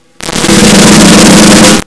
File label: drum